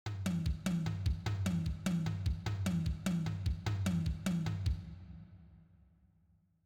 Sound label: triplets